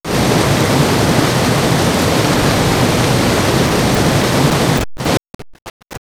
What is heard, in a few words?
Nature Brook; X-Static Goldmine